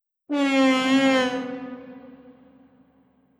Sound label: Rallying Horn